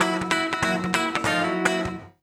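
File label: guitar, Drum & Bass, 145 BPM; Ueberschall; Drum & Bass Resonance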